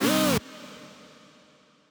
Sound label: chord